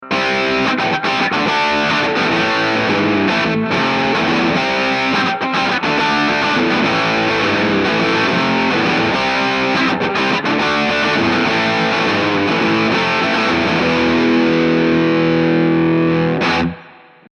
Rupert Neve Designs 511 GuitarAmp Beyer 260 NCS HPF 250Hz